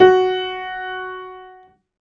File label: Piano, Harmonic